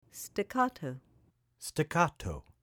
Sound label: Staccato